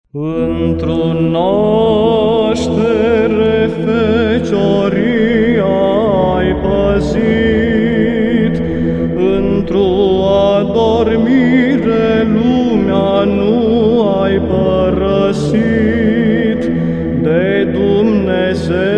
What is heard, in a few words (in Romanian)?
Troparul Adormirii glas I; Cantari inchinate Maicii Domnului; Cantari la Adormirea Maicii Domnului